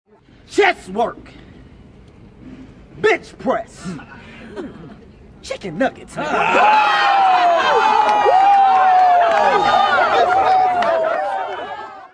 rap battle

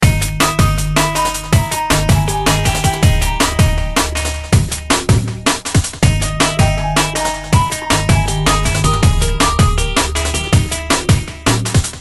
Htc Msg; Saffron

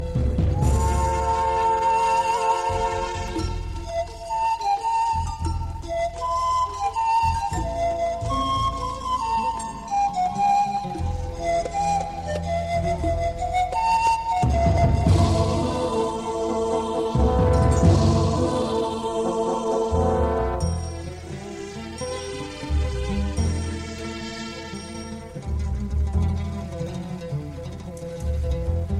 slow egypt tone

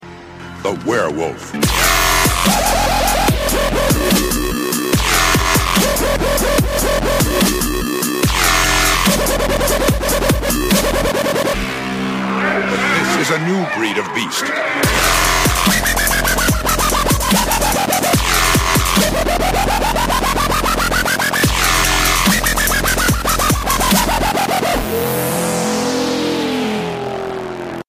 Werewolf dubstep

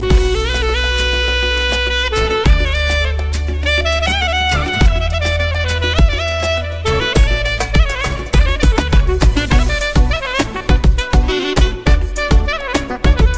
Oriental Sound

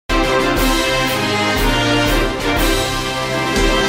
Fanfare

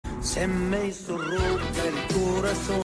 text tone

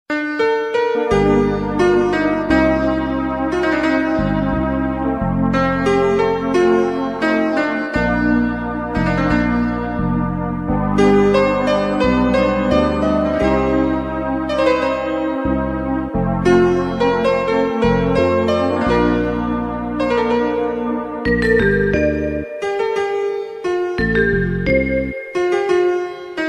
slow soft music; classical

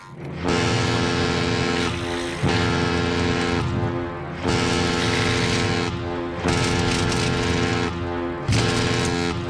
Tugboat horn

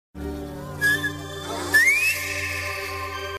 Call

